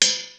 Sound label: Hat Open, Korg T3